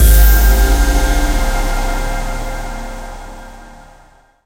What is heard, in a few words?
stinger; Mirror's Edge (2008)